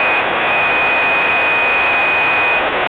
radio

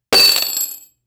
a heavy dumbbell falls to

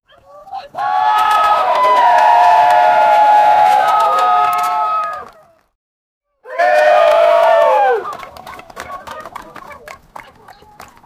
team cheers after the

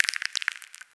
resource completely harvest

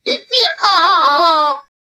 gold coins collecting sound

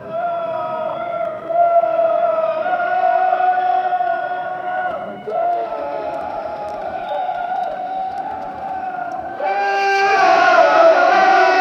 medieval orks battlefield

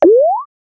arcade game button sound; gaming

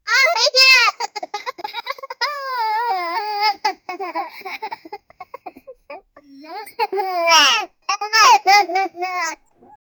laughter child

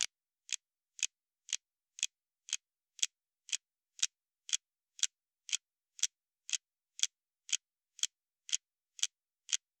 clock stretch tick slowe; timekeeping

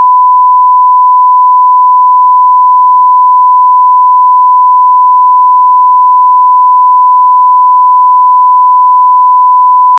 news sound serious tone, media

